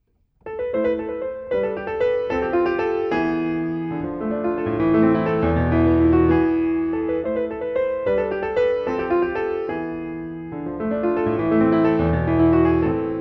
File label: Medieval Fair, piano